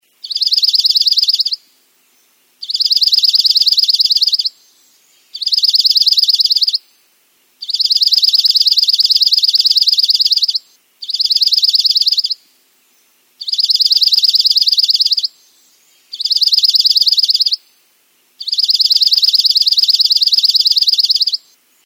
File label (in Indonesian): suara masteran rapat dark eyed junco